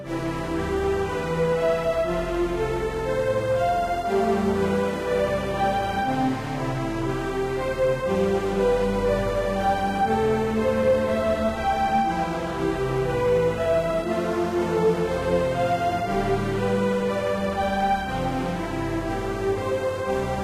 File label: romantic chill loopable